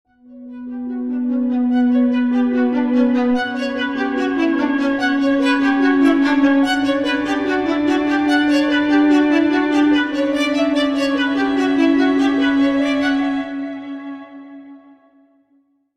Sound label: Tense Music For Breaking News And Urgent Updates